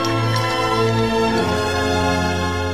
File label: organ transition